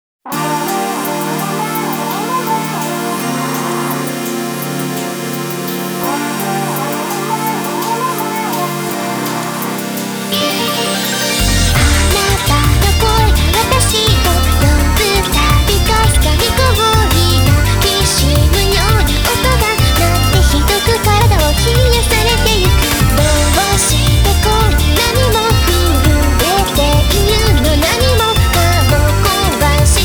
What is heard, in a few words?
Rain Trance Remix; デウスエクスマキナ